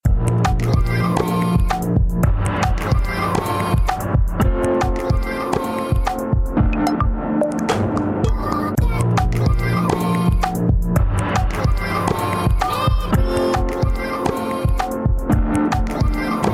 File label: drone; flOw